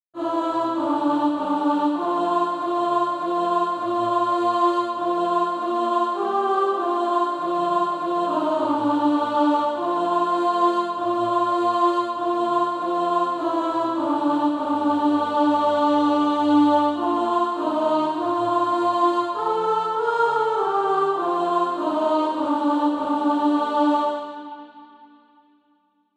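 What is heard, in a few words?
alto, Practise Music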